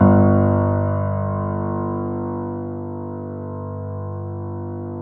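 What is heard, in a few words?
G1, grand piano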